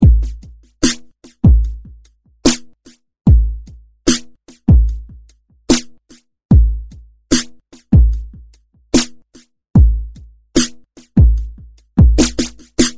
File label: beats